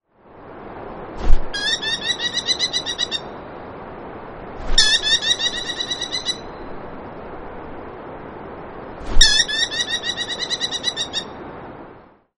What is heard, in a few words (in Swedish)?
Stenfalk